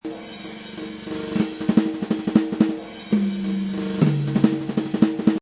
Jazz percussion and cymbals loop at 180 bpm.; Drum Loops

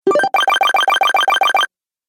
Retro Videogame Bonus Collect

